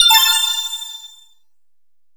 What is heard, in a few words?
Get Great Item; Side Order SFX